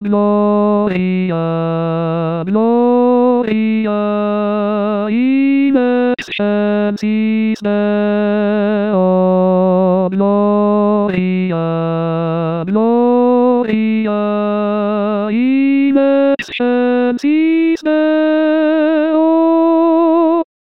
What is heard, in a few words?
gloria tenor